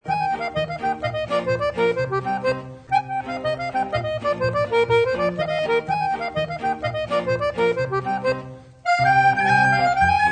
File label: trakijsko horo bulgarien